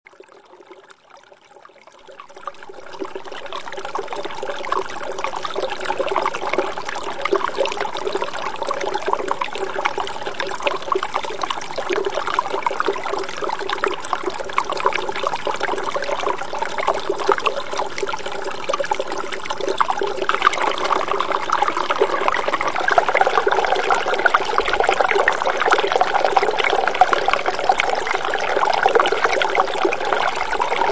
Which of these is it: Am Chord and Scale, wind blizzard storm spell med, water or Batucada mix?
water